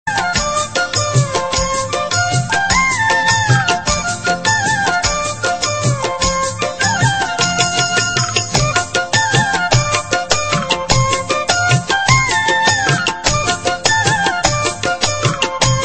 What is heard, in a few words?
Kumauni Tone